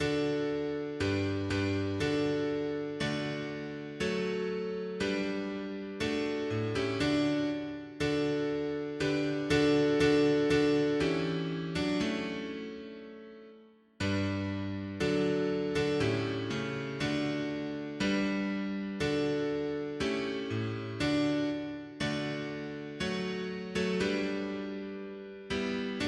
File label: piano; hymns; Deja pour nous a lui laurore